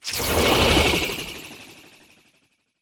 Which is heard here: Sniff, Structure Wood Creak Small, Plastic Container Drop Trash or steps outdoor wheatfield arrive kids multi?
Sniff